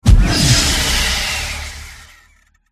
polar bear

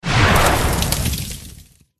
dog demon buff in

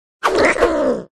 cat attack voice

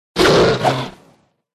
werewolf attack voice

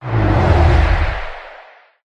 black bear

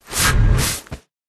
Rat dodge

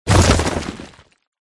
taran attack contact up

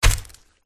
attack contact middle

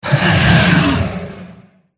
sea monster attack voice up

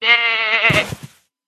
goat death

